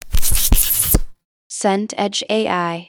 mouse drag desk

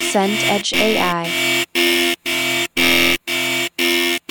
car alarm

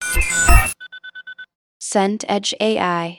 scifi hologram glitch

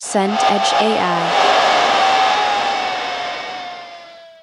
crowd cheer

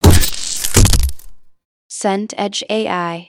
mud squelch

